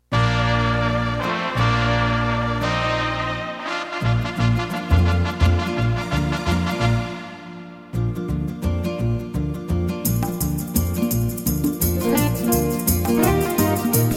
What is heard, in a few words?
Mariachi Son